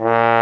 BRS BONE, Solo T.Bone; Roland L-CD702; Solo Bone 4

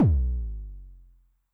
BD MOD Pitch Med Drop, bass drum, 909; classic machines; 909mod

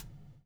BUBL, BUBBLE; Spectrasonics - Backbeat

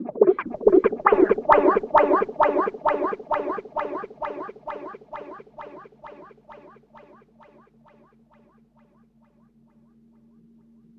Rhodes FX; EZRollers

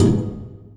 Vinyl Oneshot; Sonic Foundry (Sony Creative Software); Esoterik Beatz; Vinyl101

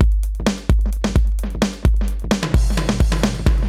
Space Echo HR-16B 130BPM

